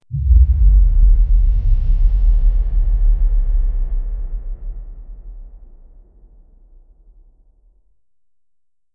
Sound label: dashing sound, bassy